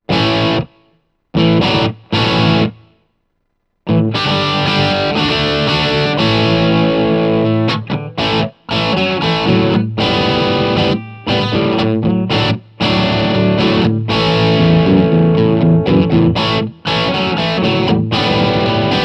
dv mark multiamp, guitar

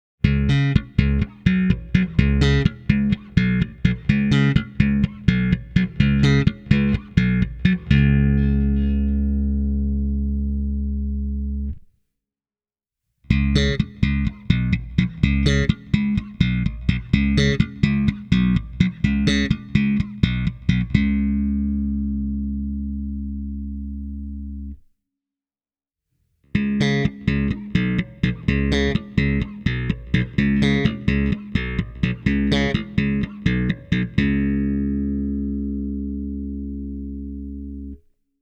mojotone 70s clone jb slap

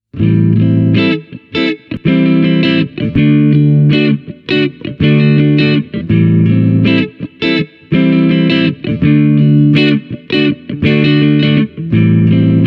italia maranello classic clean

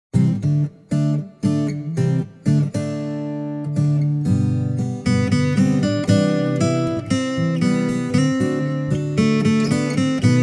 tanglewood tw40o guitars only; Tanglewood Sundance Historic; TW40D

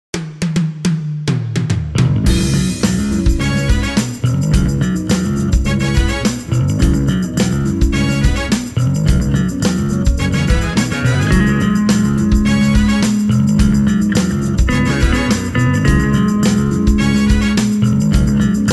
markbass evo1 demo track; digitaalinen mallintava bassovahvistin; Epiphone Thunderbird IV; G&L JB-2; LTD (by ESP) B-50 EMG; Samick Greg Bennett Delta DB-104; Sterling (by MM) SUB Ray4

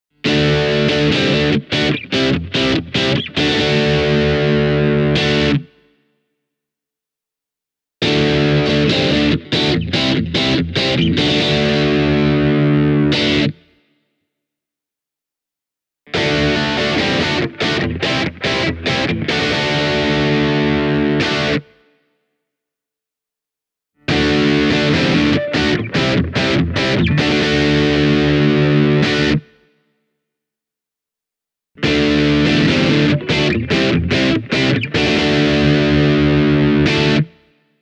esp ltd mm 30 distorted

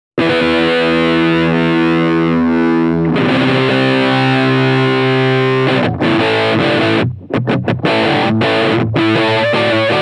roland microcube gx brit combo

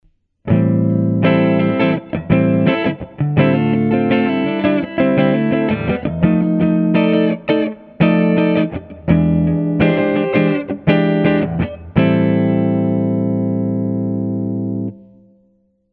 clean neck series, clean – neck-PU in series; Manne Ventura

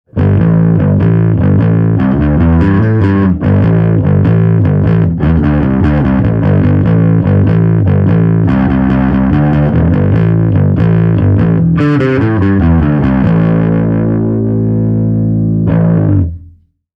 P-Man – overdrive, bass; P-Man – distortion

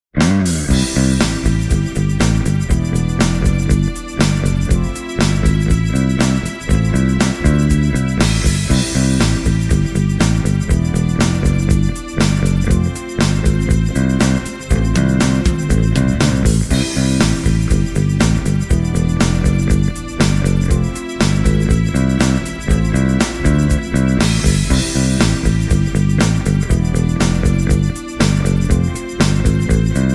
bluetone bass 200 rickenbacker 4003

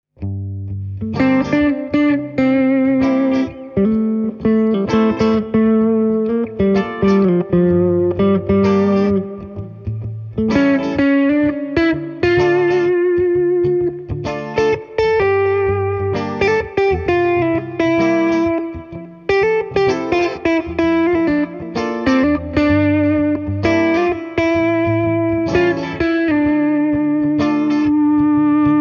bluetone fried eye 22 combo guitars only; demo track; Soolo-osuuksia varten käytin nykyaikaista Tele-tyylistä lankkukitaraa. Särön määrää on säännöstelty kitaran volumella

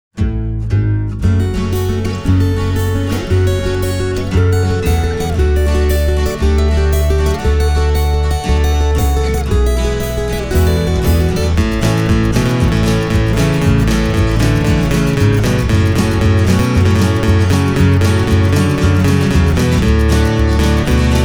yamaha ll6are; teräskielisiä kitaroita

